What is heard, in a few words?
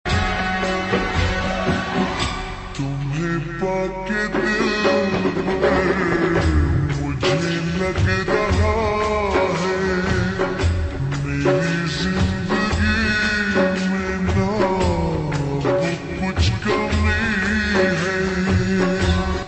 Ringtone Slowed Reverb